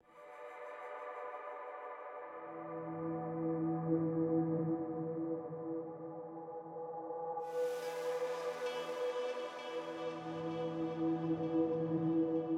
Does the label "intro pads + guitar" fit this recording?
Yes